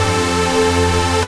chord 1, sound chord; Ueberschall; Techno Trance Essentials

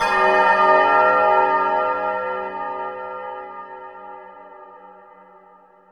CLOC; Back In Time Records; Big Bang

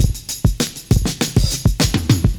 FILLS, 70_s Breakbeats

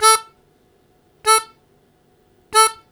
Short Attack A1, Harmonica; Zero G - Harmonica

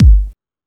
Techno A; EMU XL-7

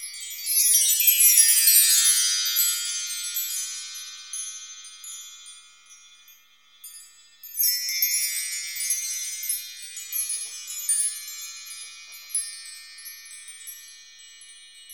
WINDCHIME, PERCUSSION; AMG - Vince Clarke Lucky Bastard

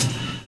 live kick; Univers Sons - Kitch 70s

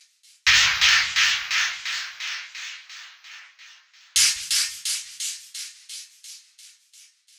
130 Perc Echo Accents; Zero-G; Future Wave

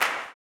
CLAP, Blues Soul; Spectrasonics Vocal Planet

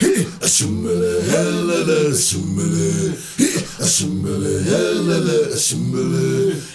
ASUMEBELE, Heart of Africa, CHOIRS; Spectrasonics; Heart of Africa 2

